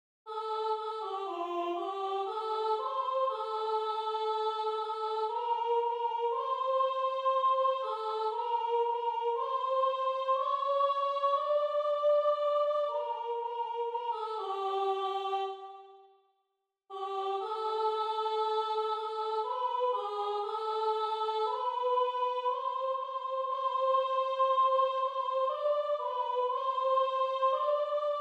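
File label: soprano; Západ slunce; 1.soprán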